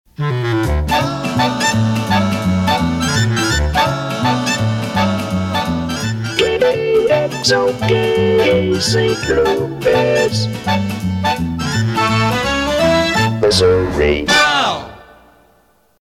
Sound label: Wow Sig Sonovox; KXOK